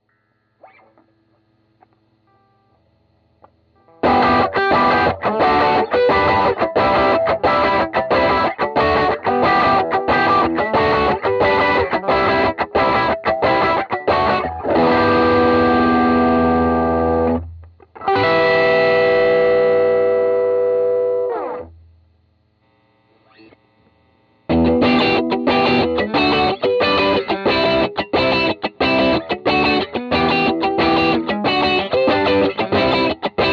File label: Drive